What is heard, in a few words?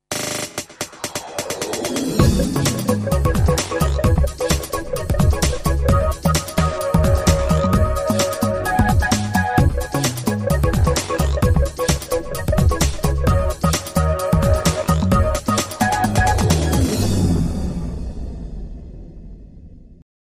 Jazzy Step